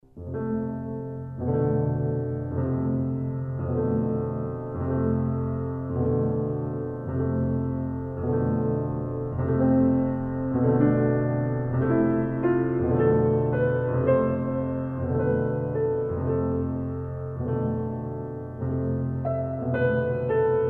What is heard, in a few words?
Prelude in F major; Ciurlionis